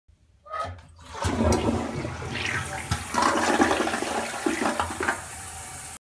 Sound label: Toilet Flush